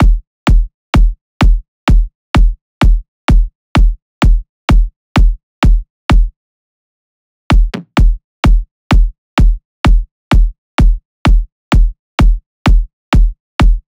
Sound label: loop kick 128; Seismic Loops